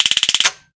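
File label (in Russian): заклинивший механизм оружия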